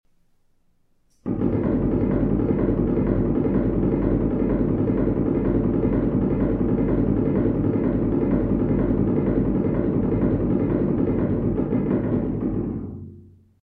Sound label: tapping